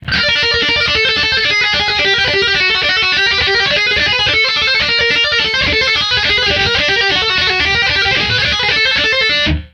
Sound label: Guitar Shred